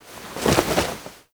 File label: Head Shake Foley; Umbra; Warframe; Sacrifice; Sacrifice Shrine Intro; Cinematics